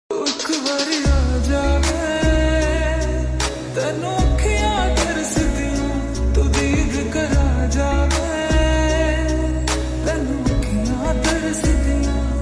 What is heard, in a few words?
Slow Reverb Version Ringtone